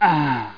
AHH; happyday

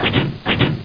machine

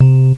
TONE

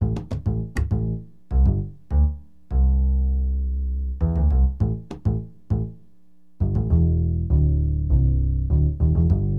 Bass, Acid Jazz Funk

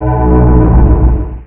game over; Tetris Max 2.3.1

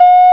mine beep; godzilla

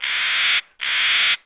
bird; DAoC

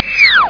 Back Whistle; Bahtzee 2.0

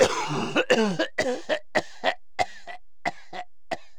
cough

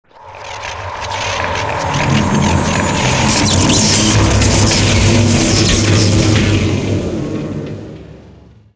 Energy Start Short

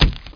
splat; Really Cool Arcade Games; Valusoft; SpaceInv

